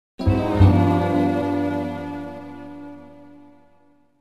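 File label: Sting Start Light; Family Guy Back to the Multiverse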